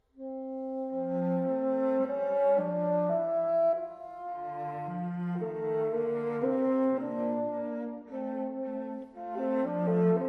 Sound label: Sonata In B Flat For Bassoon and Violoncello, Andante